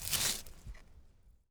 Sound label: plant cut; gmod